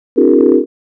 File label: cluster sine